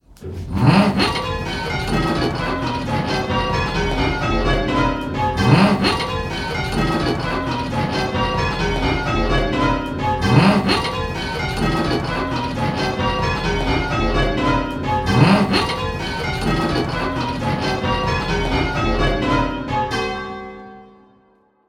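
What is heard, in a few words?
Bells ringing; Pebworth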